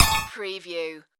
game menu select

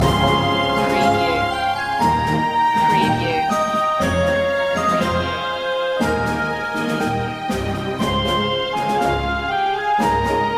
the long march home loop

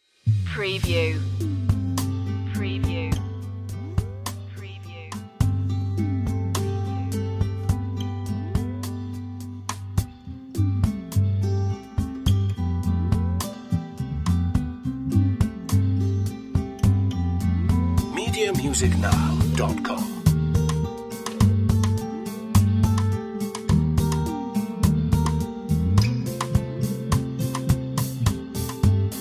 safari lite underscore no bells

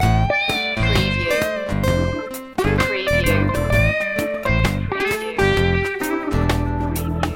sensual noon loop

